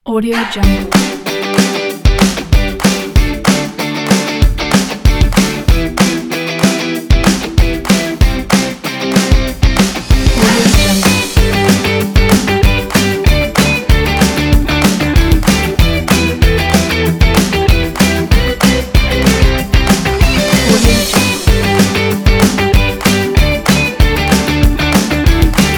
the upbeat rock

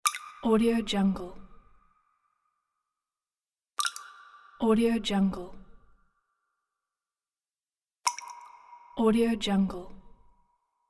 water drops

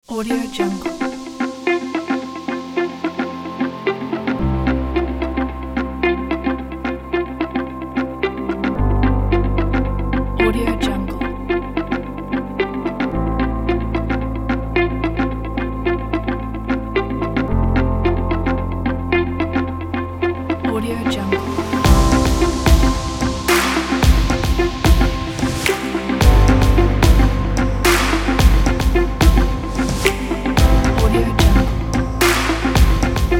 motivating uplifting inspirational corporate

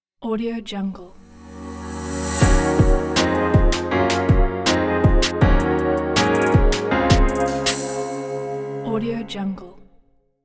chill beat logo

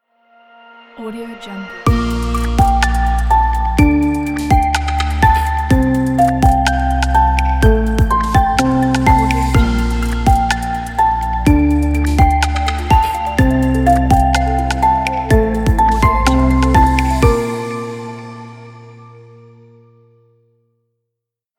glitchy abstract logo; artmaks

